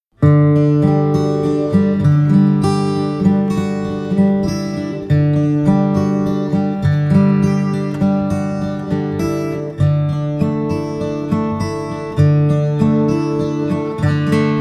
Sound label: unplugged